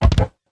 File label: yeti hit